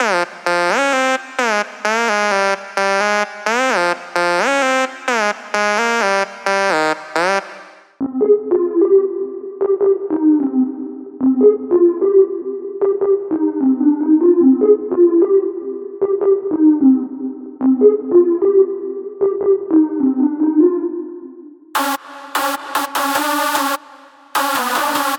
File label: Trap